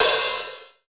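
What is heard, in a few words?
hi-hat open, drums; East West; Global Sounds; RY30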